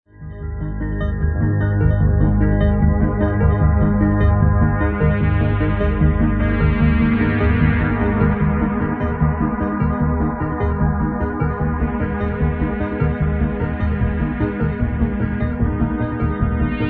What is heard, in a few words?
rail corridor; Donner Pass; Sequencer Sketches Series